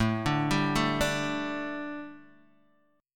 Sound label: A 7th Suspended 4th Guitar Open D, chord